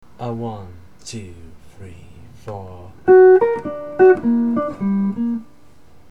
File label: minor 7b9 3 9 lick